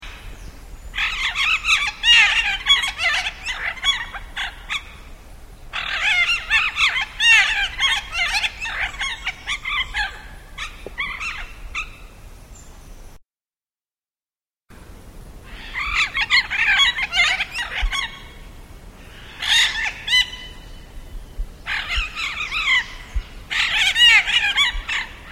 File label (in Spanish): guacamaya collar amarillo